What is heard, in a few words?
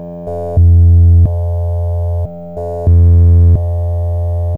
hummm table